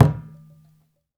African Perc

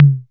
Tom - Mid Tom, Wiard 300-Series Modular

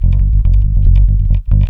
LOW BASS; Big Beat Samples & More

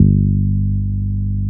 BASS GTR; East West; Phat & Phunky

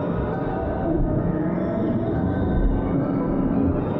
Tonal Re Draw, sparse soundscape

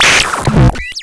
Cwejman S1 - Mechanical Arm

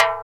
Dumbek

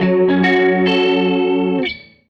Gretsch Guitar Loop

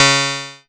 C#, PIANO; Big Fish Audio; Synclav FM

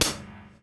DOWN, DOWN KIT 78

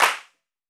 CLAP, HAND CLAP; AKAI S6000 CD-ROM - Volume 5

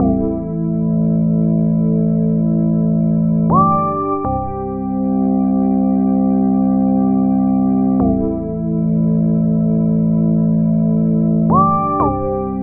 Pad, Modular Electronica; Sonic Foundry (Sony Creative Software); TSS Autopilot

